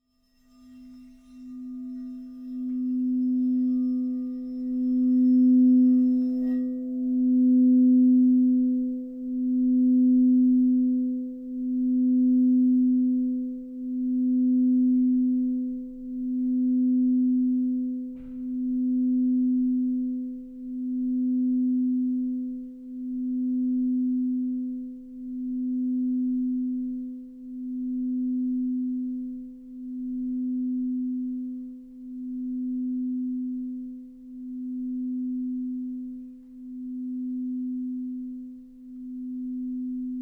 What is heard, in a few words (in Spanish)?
BOWL RE; sonidos de cuarzo; escucha y armoniza los chakras